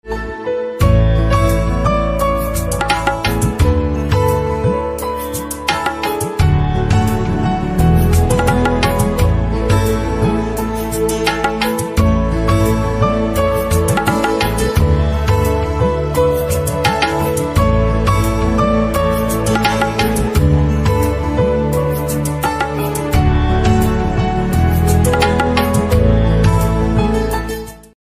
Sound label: Piano